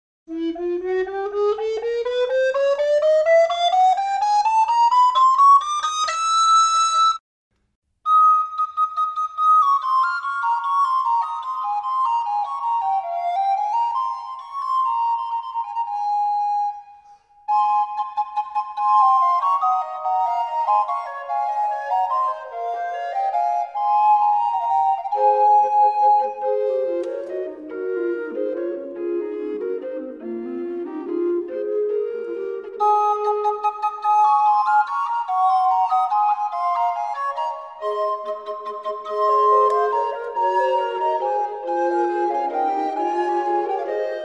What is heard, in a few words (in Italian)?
flauto a becco